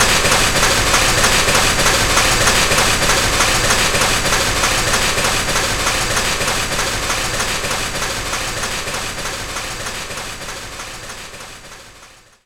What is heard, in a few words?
Enemy Hourgrass Active Loop